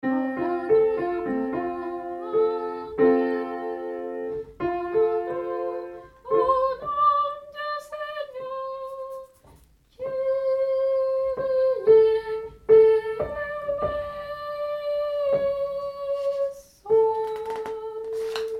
Tenor; Au nom du Seigneur

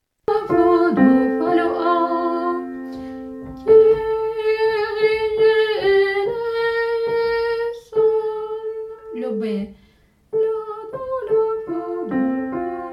kyrie tenor

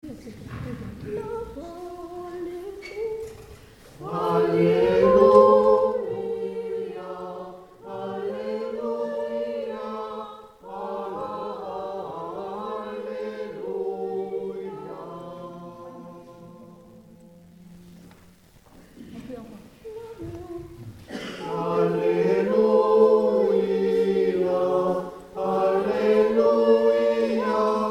Alleluia ensemble

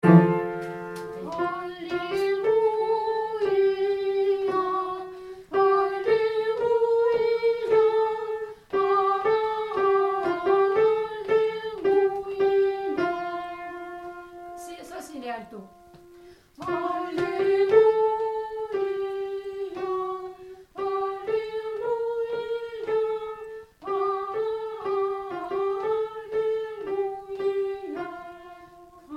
Alleluia Alto

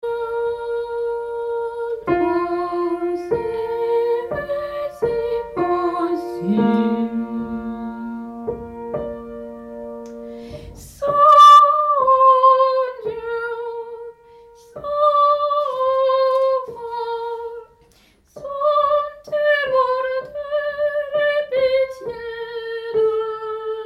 Trisagion Tenor

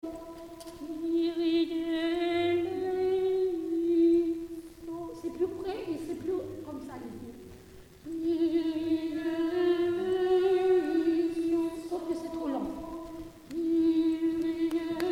kyrie ensemble; defunt

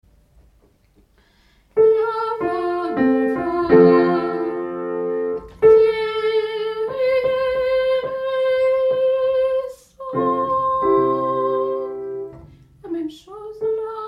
Kyrie Tenor

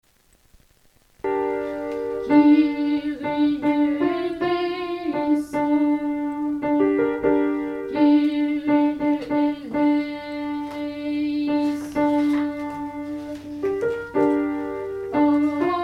Kyrie Alto

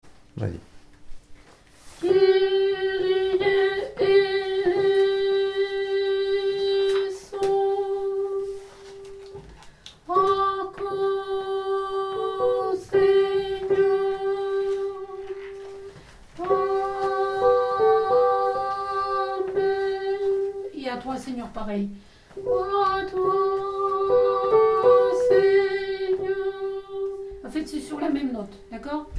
Kyrie Alto; ectenie paix

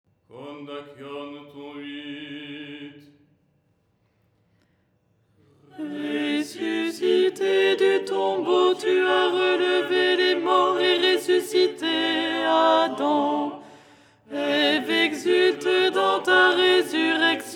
ton8 alto

